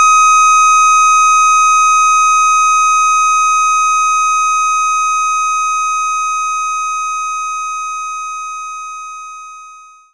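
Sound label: Jazz Guitar Loop; knights try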